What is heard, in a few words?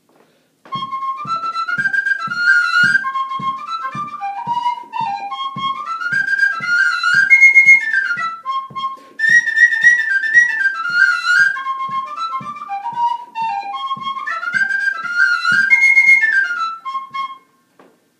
Beggar Man WHISTLE